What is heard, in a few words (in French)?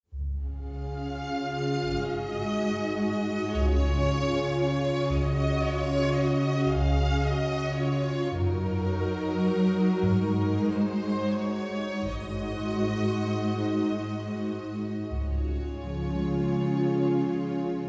accordeon